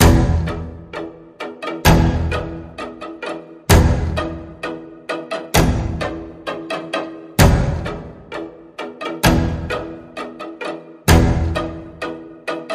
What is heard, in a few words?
Sinister String Pulse E, Key of E